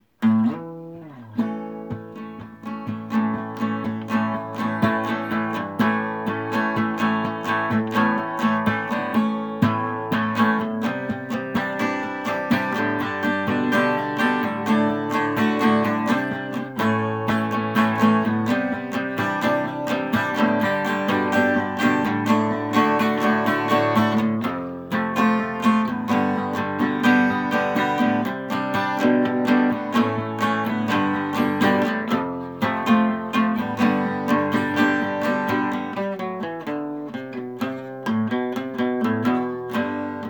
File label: acoustic; ez installations